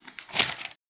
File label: item craft; action RPG game; Adventures in Lestoria; Sig Productions